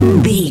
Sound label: retro damage hurt ouch